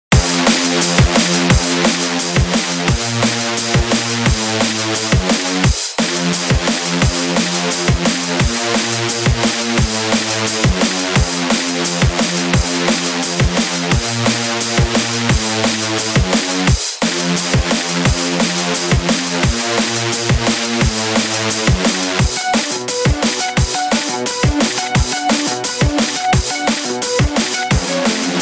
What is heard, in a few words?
Drum n bass